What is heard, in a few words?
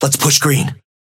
synth ping push